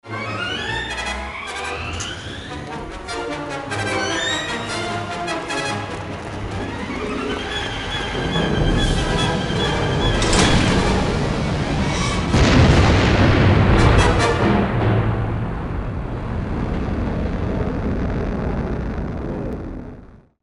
ship takeoff; Star Wars Episode I The Phantom Menace

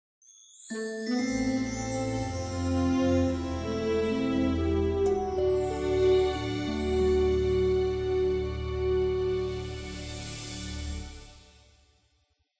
Success Main Theme

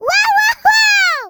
Classic Miss Sound; Jolly Battle; Android

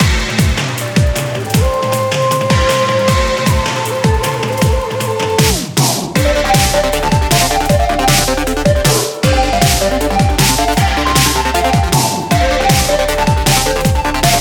jungle

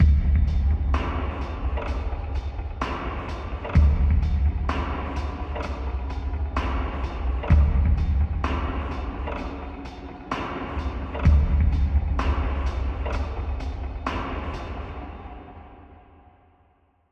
Cave Music

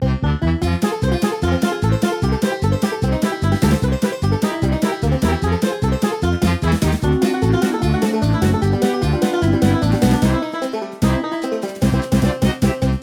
bgm event comical

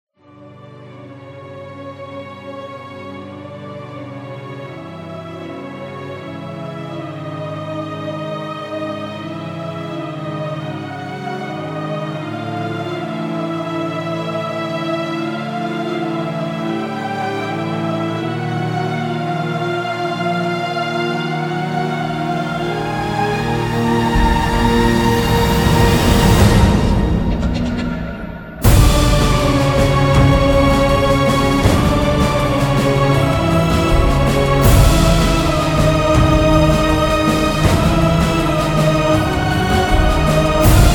BGM Intro Cinematic

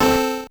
core squish A2 C2

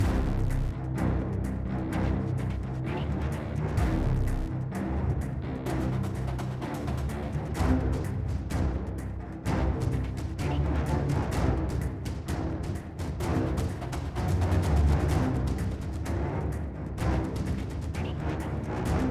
Angry Music; neko journey

